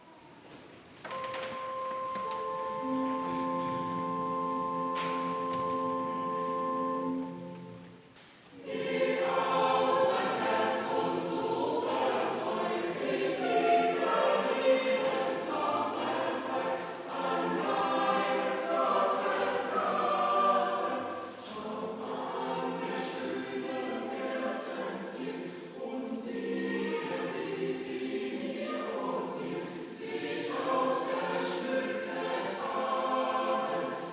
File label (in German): Chor